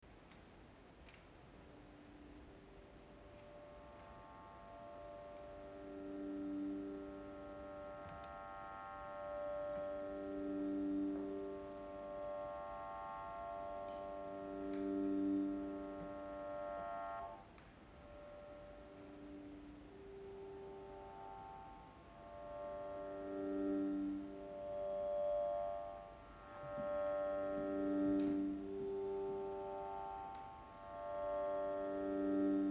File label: NOISE MIDI (live)